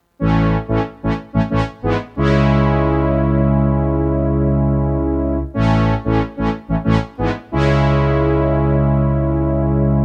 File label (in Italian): suono, Clavia NordStage